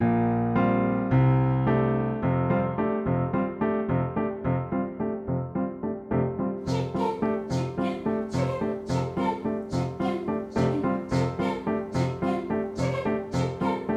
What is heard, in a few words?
BASS